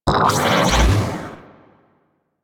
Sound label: Mag Magnetize; Warframe